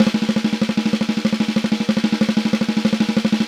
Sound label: Snare Drum; Q Up Arts - Johnny.C's Vintage Snare Drum